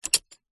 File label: horse saddle horse stirrup iron metal foot in movement exaggerated; animals